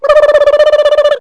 voc funny, cartoon; Wired for Sound Pro CD; Aristosoft, Inc.

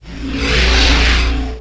impact simple; Earth and Beyond; EA Games

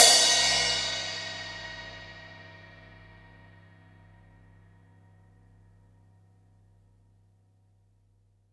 15 Inch Dark Crash; Pro Sounds Sample Collection; Drums And Percussion

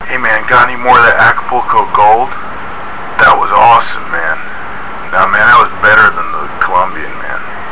phonecall

